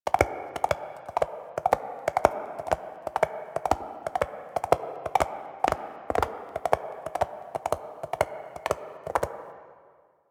Horse Steps Gallop Loop Concrete; Vehicles; Duviri

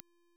Bow Second Explosion, Weapons; Warframe; Corpus

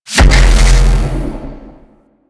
Beam Fire; Warframe; Quests; The New War; Erra; Abilities

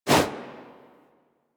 Generic Weapon Light Aim Transition In; Warframe; Weapons

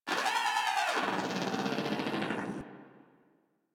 Moa Aggressive Vocal Death; Warframe

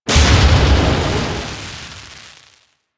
Railjack Exterior Ship Wreck Sparks; Warframe

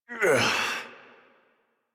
Struggle Short, Efforts; Adult; en; Warframe; Duviri; Drifter

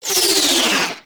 Ship Dual Cannon Tail; Crp Moa Dual Cannon

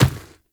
Infested Medium Run Dirt, Footsteps

